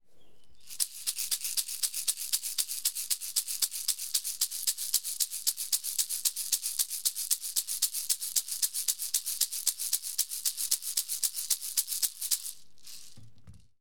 Natural seco, sonaja

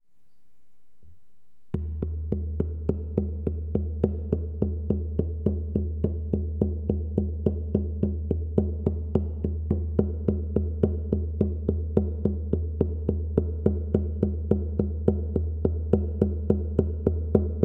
Tambor Temazcal reverb

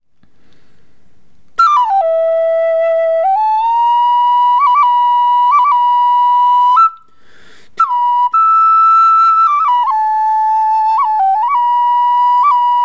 Palo rojo seco, flauta en mi5 de 5 agujeros

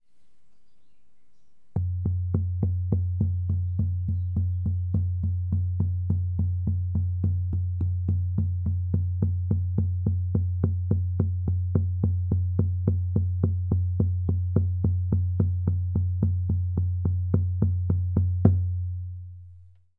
seco, tambor

